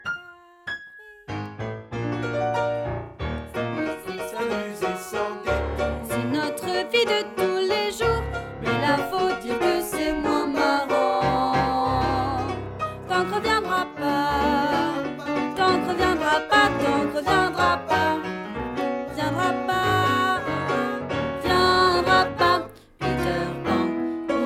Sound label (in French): Dernier refrain Alto